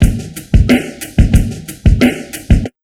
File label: 91 DRM SND, Pure Electro; USB Soundscan vol.03; AKAI